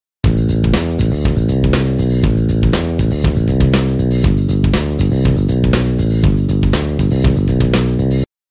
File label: bass